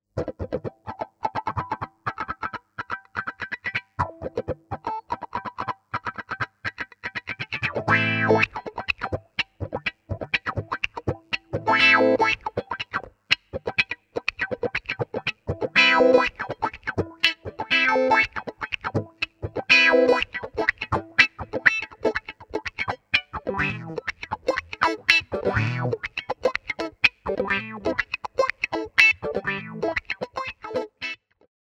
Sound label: Wah wah